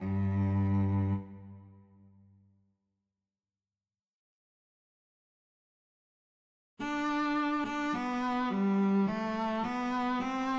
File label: violoncello; HWV259